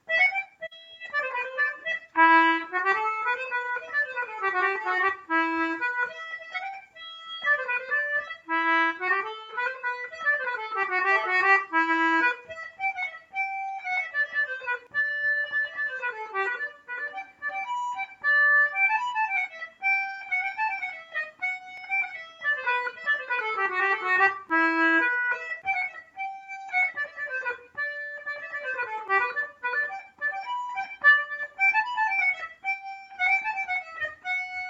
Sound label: Em hornpipe